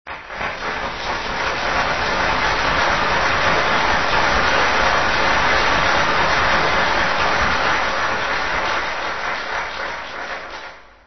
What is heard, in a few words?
applause